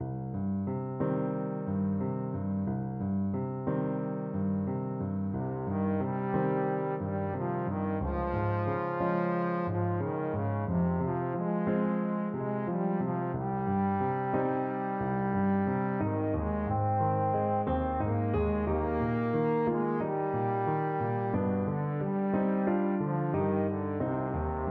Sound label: trombone